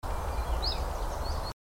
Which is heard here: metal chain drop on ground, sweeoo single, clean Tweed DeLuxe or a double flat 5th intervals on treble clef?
sweeoo single